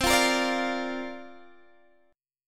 C 7th Suspended 2nd Suspended 4th C down Guitar Open D